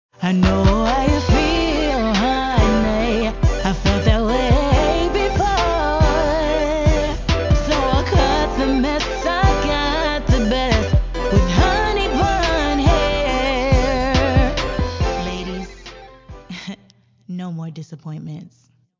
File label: jingle